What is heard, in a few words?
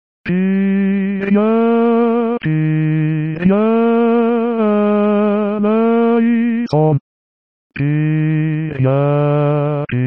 basses; Kyrie; Missa pro pace